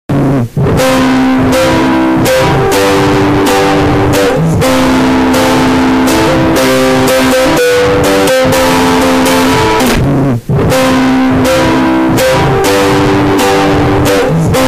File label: Ukulele trap remix